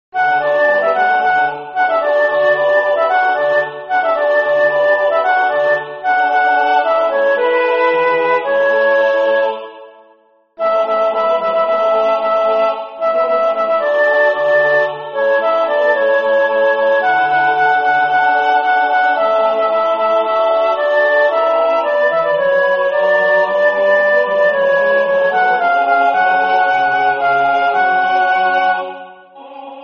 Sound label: Et Resurrexit, Soprano; Zelenka; MissaPaschalis